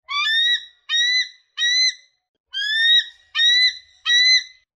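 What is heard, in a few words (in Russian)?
крик орла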